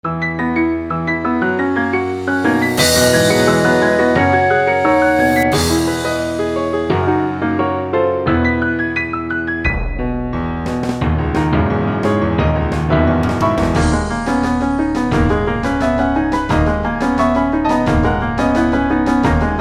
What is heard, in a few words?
piano music